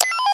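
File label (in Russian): switch-off beep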